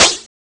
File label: smash; ST-XX Amiga Soundtracker Sample Packs